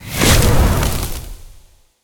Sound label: Nature Spell Vines Whoosh; Legend of Keepers